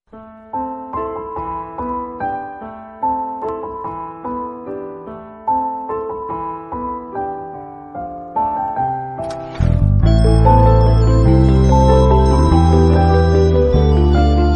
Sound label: amma background music sentiment; kgf 2